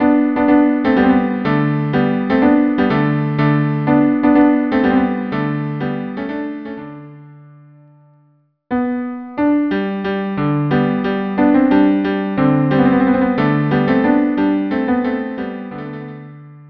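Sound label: Tambourin and Menuet cello duo; Corette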